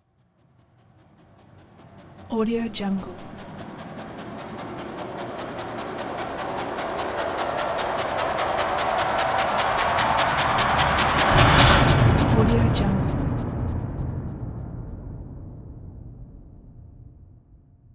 bloody nightmare riser layers; soundmorph

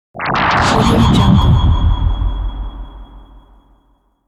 teleport

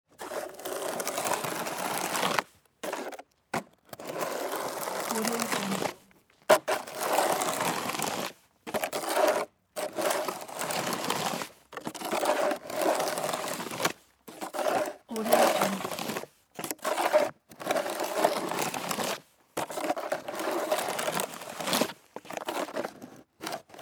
shoveling snow and scraping with plastic shovel

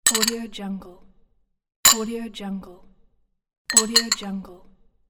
coffee cup

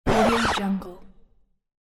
rewind

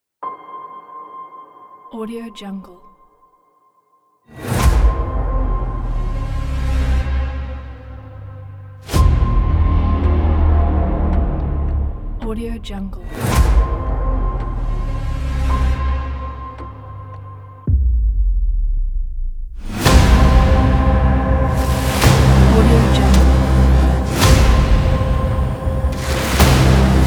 dark horror thriller trailer; cinematic-trailers

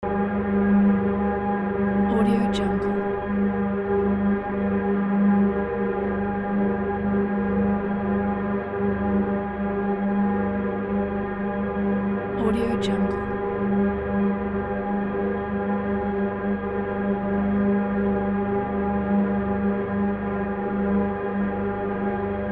anxious drone; tineside

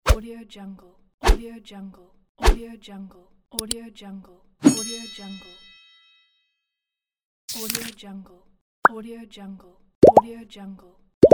infographic sound effects; medusamusiclab

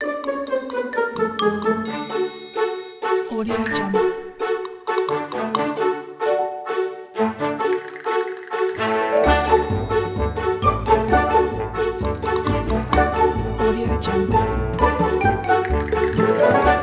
funny cartoon

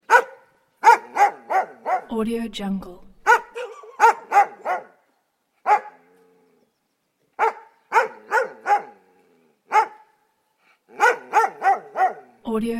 large dog barking constantly; alexander blu

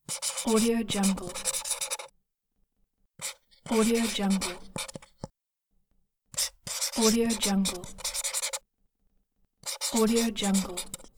writing with marker

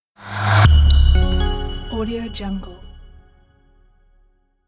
animation logo minimal short

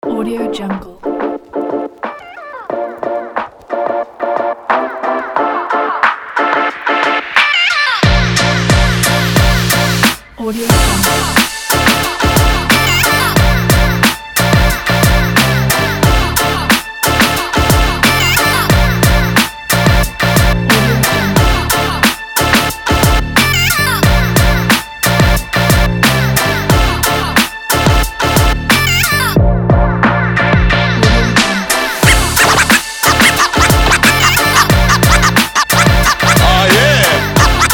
hiphop is hiphop kit

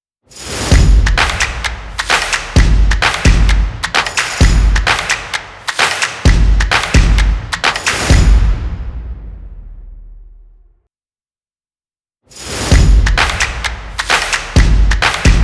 drums and claps logo; grandsoundstock

